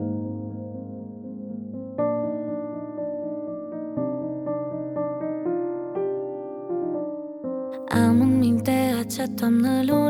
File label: Piano; Românească